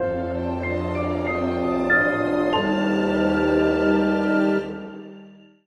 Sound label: winter intro